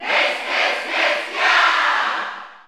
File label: Cheer German; Ness; SSBU